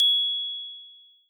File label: G#7 bell